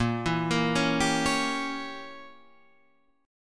A sharp Diminished 7th Guitar Standard; 6,7,8,6,8,9; arpeggiated; JGuitar

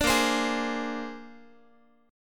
Bb 9th, Guitar; strummed; JGuitar

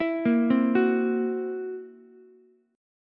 Fsharp 7th Flat 5th down Ukulele; arpeggiated; JGuitar

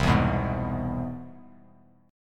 C Augmented Major 7th, Bass Standard; strummed; 8,7,9,1; chord; JGuitar

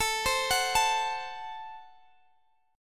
Gb Diminished

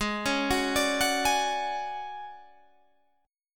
D Major 7th Flat 5th Ab, chord

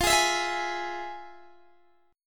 F Minor Major 7th, Guitar DADGAD, chord

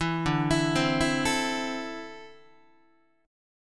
D 7th Suspended 2nd down Guitar; D7sus2 arpeggiated

